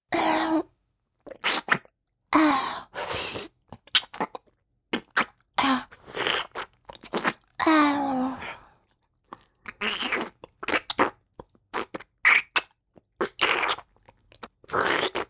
お掃除フェラ(弱):亀頭から滴る残り汁を舐め取る, チュパ音

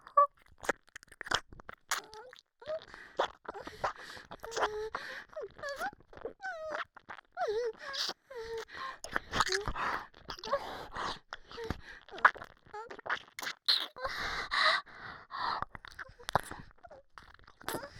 触りあいながら耳舐め, 左耳, ASMR

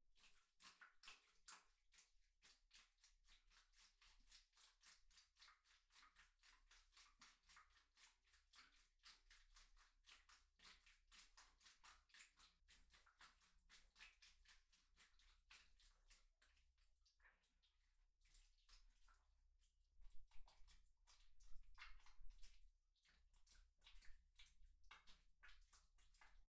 クチュ音 棒 スパート; KU100; アダルトな音

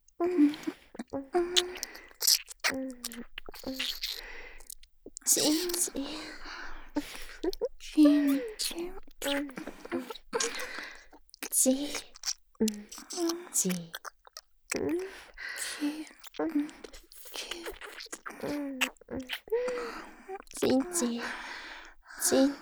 キス; おまけ4（文×名月）; 左右反転版; L名月R文

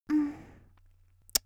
「キス」ん～チュッ; 綾波系少女

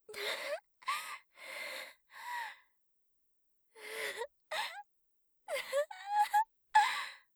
うっとり笑う; 効果音あり

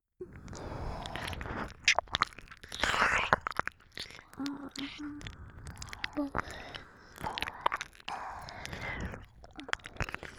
ループ 耳舐め, ASMR; アナニーサポート

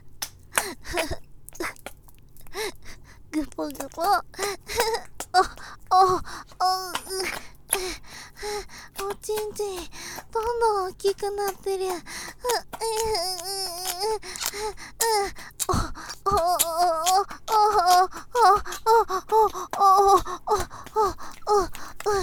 オホ声

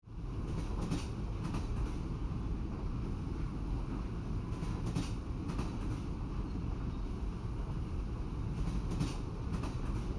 首筋から徐々に上に…ヘッドマッサージ

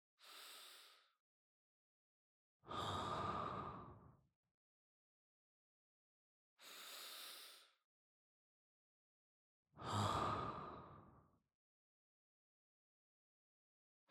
安眠用寝息（ループ対応）, ASMR

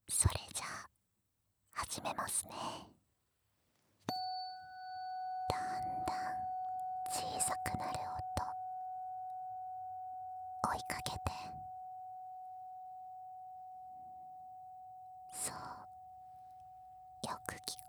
音叉ヒーリング 741x528, ASMR

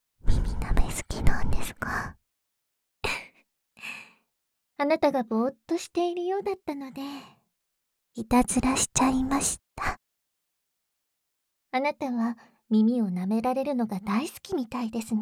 手淫 耳舐め; ひとりえっちをちょっぴりサポート

